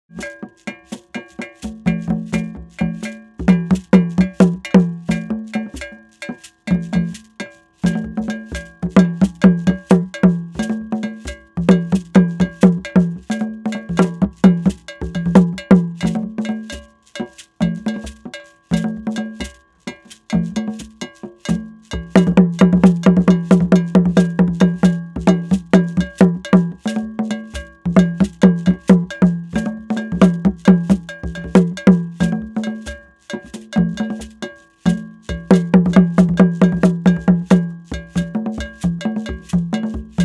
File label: Percussion